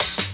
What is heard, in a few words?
Dance Mega Drums, KIT D+B; Best Service